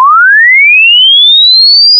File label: Upsweep; EMATT; cruise Aug2010